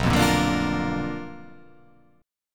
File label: B Minor Major 7th Flat 5th, chord